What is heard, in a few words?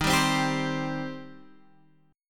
C Minor Eb